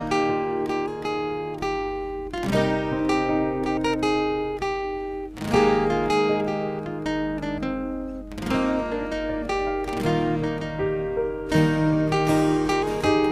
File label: guitar